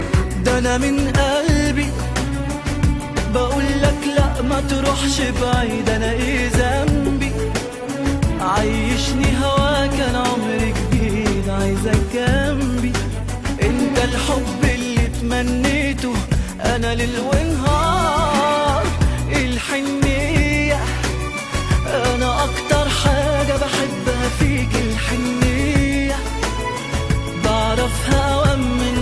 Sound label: Arabic Tone